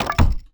Reel Bounce

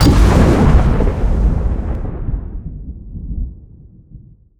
scatter Hit; netent

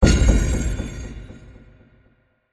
small Win; netent

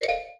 Button Stop; secret of the stones; netent

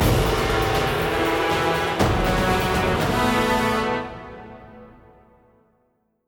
Free Spin Exit; netent

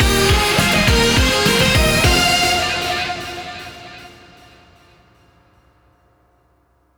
freespin win; netent

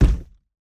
reel stop

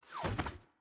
new bricks fall; netent

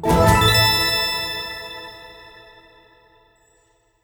random Wild Star Land End; netent

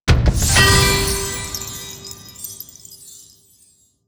scatter Hit; netent

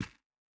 reel bounce; netent

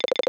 reel spin; netent

